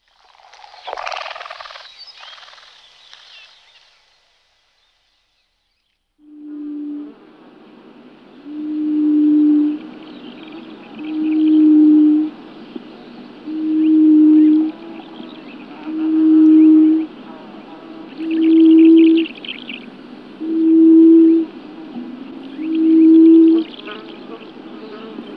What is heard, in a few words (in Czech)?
Perepel maly Turnix sylvatica, nasiptaci